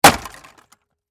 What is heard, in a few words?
Impact Automobile Grill, Hit, Smash; Crashes (Sound Effects:Objects); BlastWave